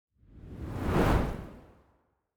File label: whoosh